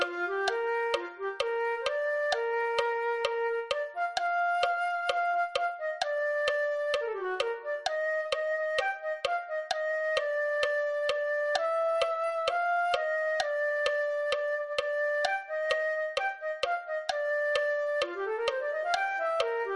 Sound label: flute